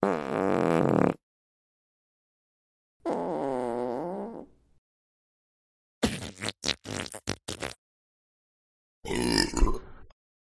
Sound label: farts